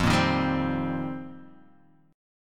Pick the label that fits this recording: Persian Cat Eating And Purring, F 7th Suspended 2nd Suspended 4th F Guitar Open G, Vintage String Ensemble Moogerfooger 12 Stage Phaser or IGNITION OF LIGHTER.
F 7th Suspended 2nd Suspended 4th F Guitar Open G